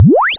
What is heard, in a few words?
dry perc, Quasimidi Rave-o-lution 309